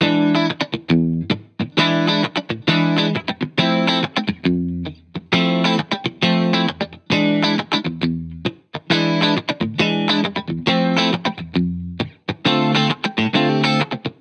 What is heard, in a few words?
Guitar Bpm135 Key E7, 70s Guitars; Fox Samples - Back to the 70s Guitars